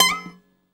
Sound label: BZ GLISUP, BOUZ